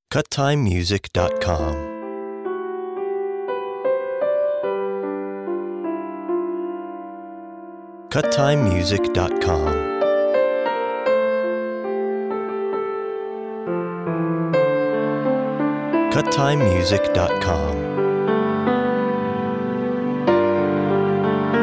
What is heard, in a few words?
orchestral pensive